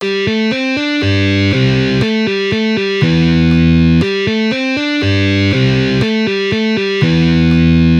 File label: Easy Guitar Riffs